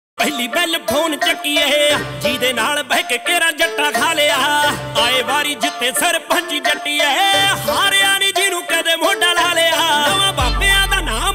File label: punjabi ringtone